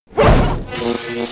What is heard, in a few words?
war attack; Heller; Mob